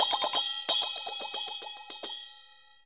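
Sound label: CHINA SM8 L, China cymbal; Spectrasonics - Heart of Asia CD2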